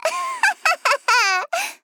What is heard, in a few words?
Laugh